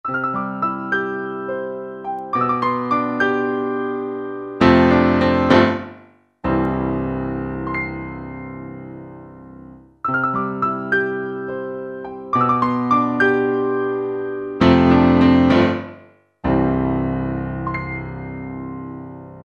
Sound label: Stereo Sampling Demo, Yamaha PSR-3000; Factory Demos; YAMAHA Keyboard